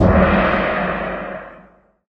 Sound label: case announced